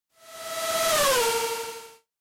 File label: Racing Pass By